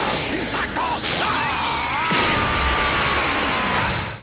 Scream

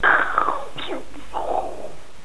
die

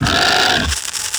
guard pain

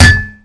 jellybean hit

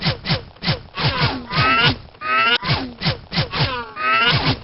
Wolfenstein weapons

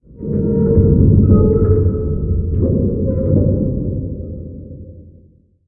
muffled clang

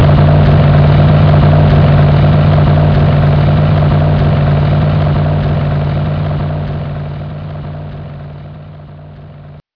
boat

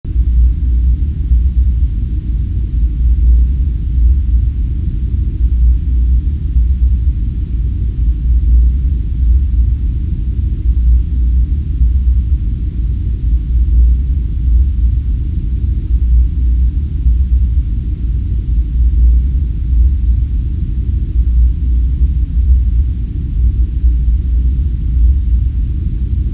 Environement; kzfr fantasy ez

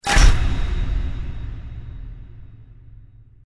pickup

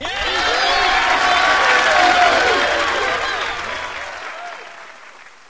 boom succes; de therealrats

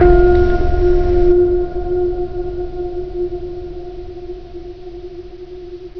signal

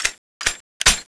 reload, weapons; skull2

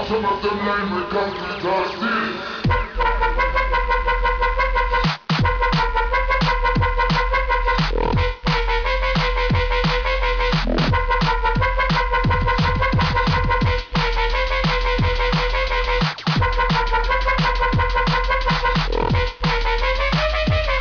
music

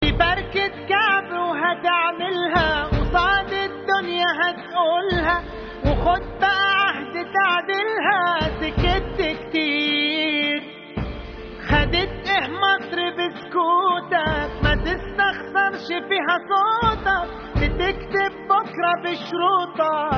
music

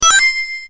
ring touch; kz unreal

